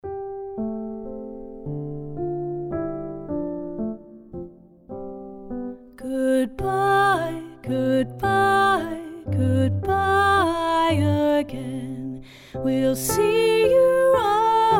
Music Goodbye; Music-makers

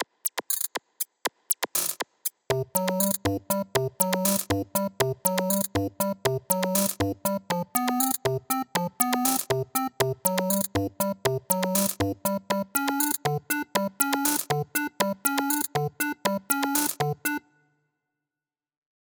bass part (C major)

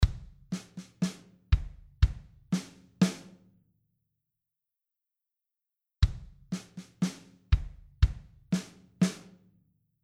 Rhythmic motifs repeated

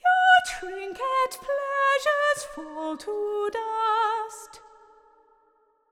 Hush, Lullay, vocal phrase

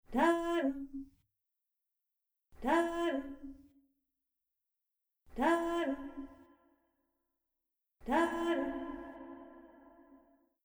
Woman's voice – interval – dry to reverberant; Sound-makers

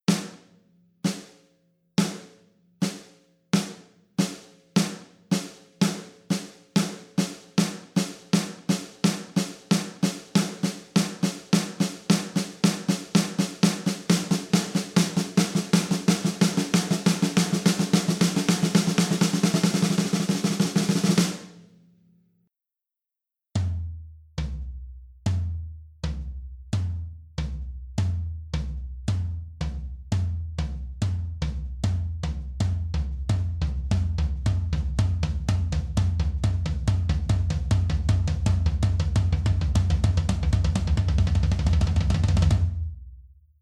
Beats speeding up

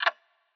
single tick of clock

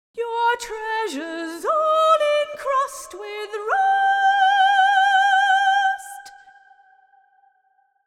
Hush, Lullay, vocal phrase

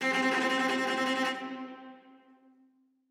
cello note C

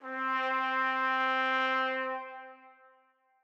flugelhorn note C

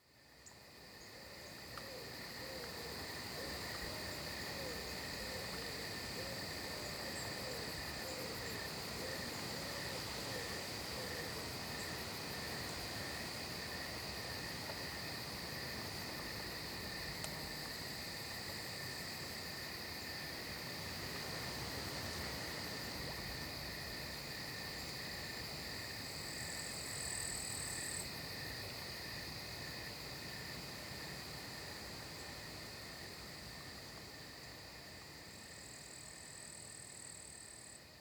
night-time sounds

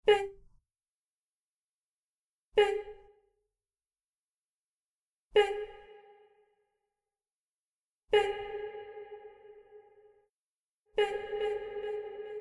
Woman's voice – note – dry to reverberant; Sound-makers

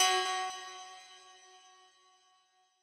medium metal strike

